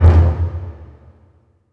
HIT, orch hits; Orchestral Loops

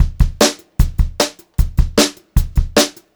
Brushed Drum Loops

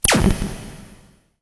SHORT, SHORTNOIS; AKAI; USB Soundscan vol.14 - Special FX Twisted

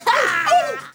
FEMALE RAVE; Spectrasonics; Heart of Africa

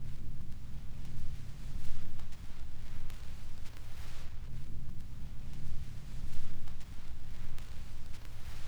Tape Noise; noise hiss crackle

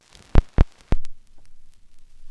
vinyl; Masterbits; Vinyl Attack

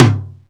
DRM, YAMAHA R5+RX

